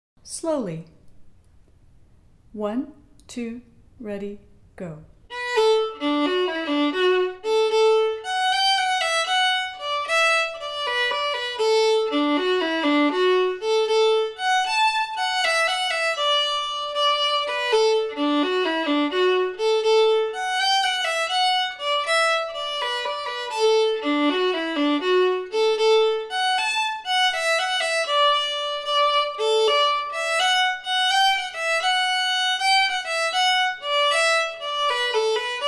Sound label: Slide slow